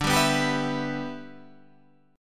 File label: G 6th D